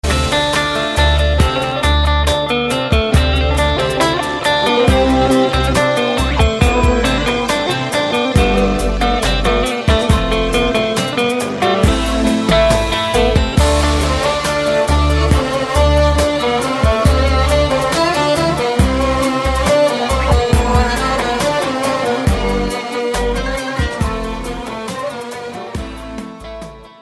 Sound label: Sad Turkish Turkey Ringtone